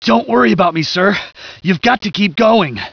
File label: generic wounded